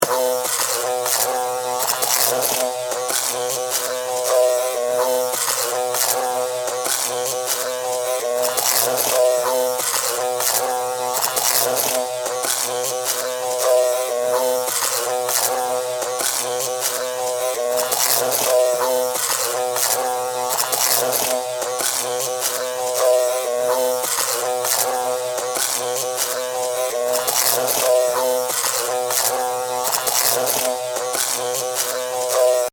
Electrical Arching, Zaps, Current, Flow, Science Fiction; BlastWave